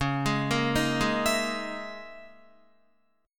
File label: Db Minor 6th Add 9th Db down Guitar; Dbm6add9 arpeggiated; JGuitar